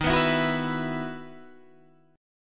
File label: E Major 7th Suspended 2nd D sharp